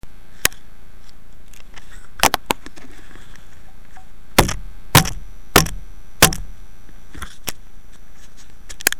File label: petbottle